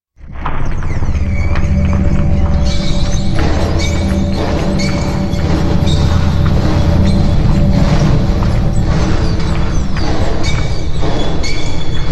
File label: Background Machinery B; Warframe; Ambience; Grineer; Grn Boss Room 1